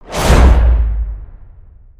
ui pop turnin; missions

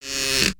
Gnarled Torch Creak

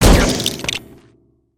laser cutter smash; destructibles

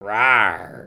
Dragon Emote; emotes

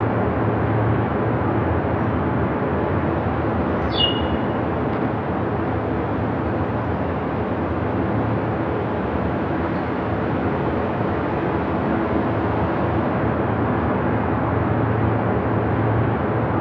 wing, ambience; Ninjago

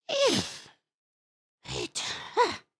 indignant, emotes

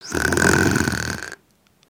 snore in

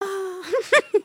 dance; emotes

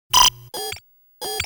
Launch Pad Beeps; gnarled forest

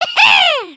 celebrate; emotes

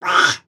Ribbit Frog Hat Bwah; creatures organic

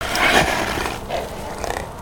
horse snort; creatures organic

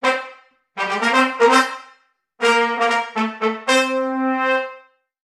Funky Horn Item

